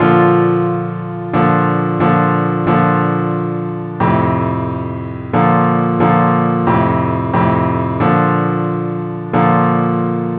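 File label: simple acc key B flat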